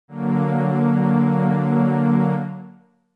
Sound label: F# Augmented